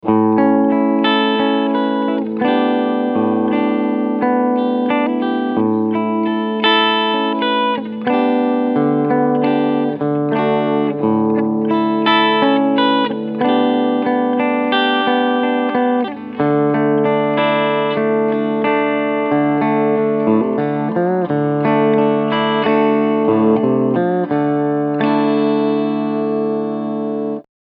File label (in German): Clean Akkorde